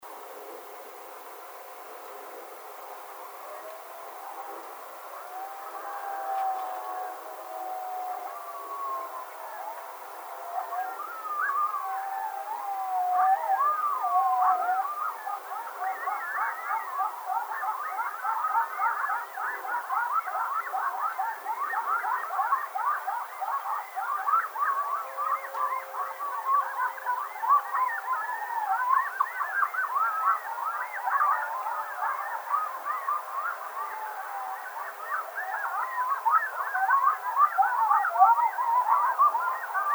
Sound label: howl, Coyote; Coyotes (Canis latrans)